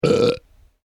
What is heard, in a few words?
Revolting Man Burping; Burp Sound Clip; Burping Sound Effects; human sound effect